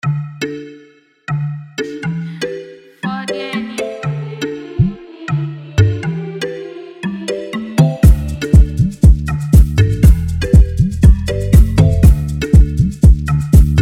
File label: instrumental